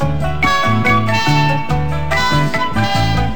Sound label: horns, Loops